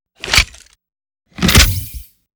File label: railgun reload; gamerip; shattered horizon 2009